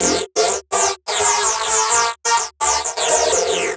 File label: Ghetto Tech, Synths; Sonic Foundry (Sony Creative Software); Underground UK House & Electro